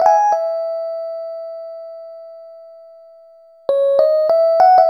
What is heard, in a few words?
Electric Piano; Club Joints and Street Anthems